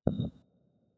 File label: 3D Put Down; Martian Chess Audio